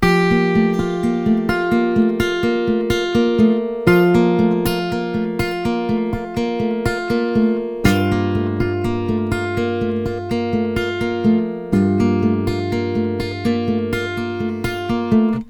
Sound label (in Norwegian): dpa 4011 fingerspill